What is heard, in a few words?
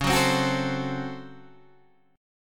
C sharp Major 7th Suspended 4th Sharp 5th, Guitar, Open G